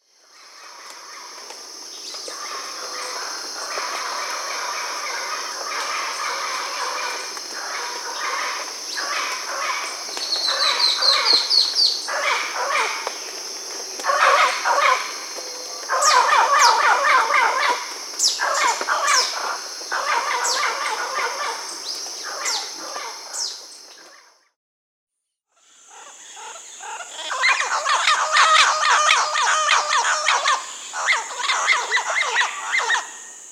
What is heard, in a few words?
Yellow-crowned Parrot (Amazona ochrocephala), Peru; S. America